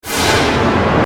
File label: Transformation, Transporter, Science Fiction; 5.1